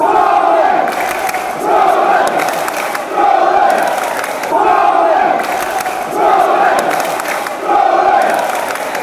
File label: crawley claps